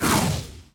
enemy warlock ranged cast

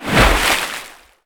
crystal serpent emerge

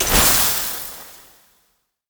gnome poison con frasco

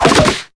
melee fast hits; wukong hero

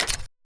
hero scroll

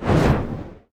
heroes basic Attack cast; lumenir

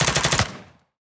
enemy rolling Sentry attack

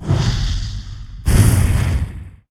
hero dragon napalm

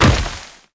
princess ranged impact

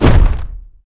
boulder hit; hero grawl

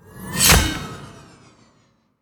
curse of dispair; bittering rancor; lynn

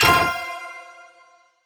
victory stars

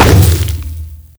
Fireball Hit

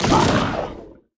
death; enemy; shadow alfa

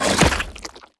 heartseeker cast; heroes; grimson

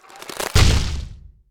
big punch; hero grawl

